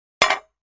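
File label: Large Tile Square Drop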